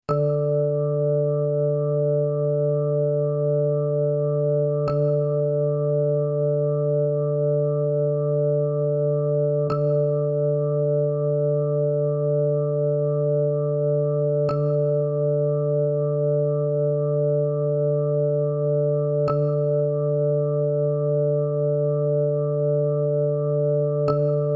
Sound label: Tuning D Jazz Organ Sound